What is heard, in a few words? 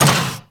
Moa Combined Step Default; Warframe; Footsteps